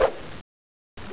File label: knife slash; flash